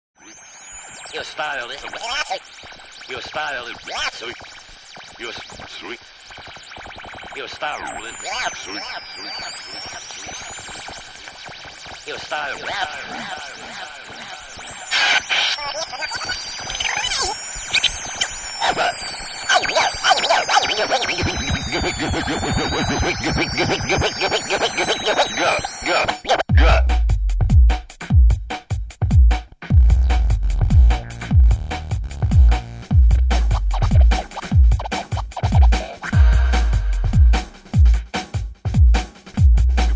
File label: Drum